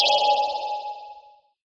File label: ping; burncard; sat uplink; Titanfall 2